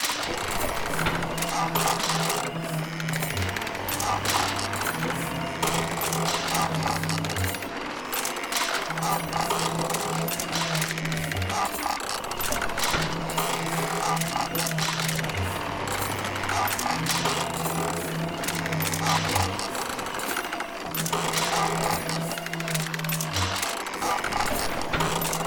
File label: Steampunk Machine; Blastwave FX